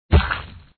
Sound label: Snowman Good Hit; sumo snowman